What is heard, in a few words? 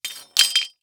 Weapon Broad Sword Place On Concrete; AdobeCC